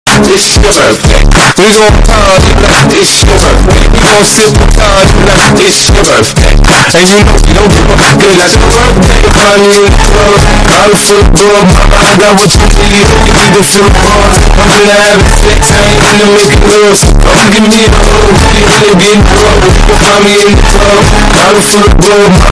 earrape

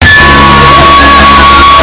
ahhhh

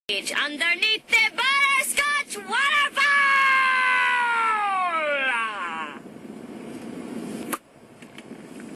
waterfall

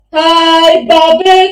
pigeon

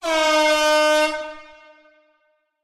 air horn reverb

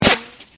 whine

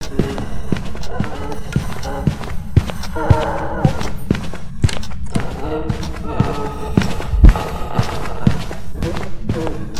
random footsteps; phasmofobia

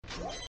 defuse spike

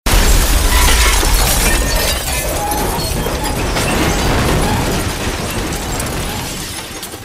crash sound effect; burnout 3

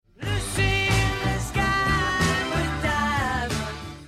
alert

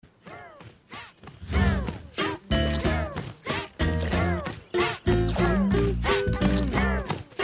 ant chant; animanimals

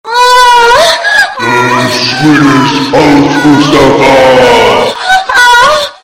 jingle

